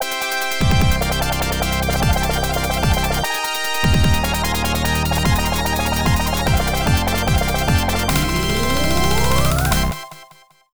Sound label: Bonus Fanfare; surprise attack; arcade; Bonus Fanfare 2 (10 Perfectly Correct Answers)